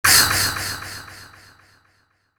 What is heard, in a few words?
Echo Uhh, Vocals; AMG - Prototype Drum & Bass